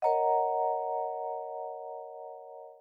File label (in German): Klingel Akkord